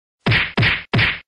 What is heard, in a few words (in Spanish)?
golpe